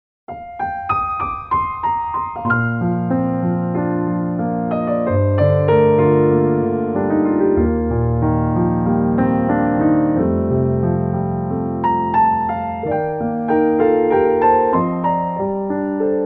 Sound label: piano solo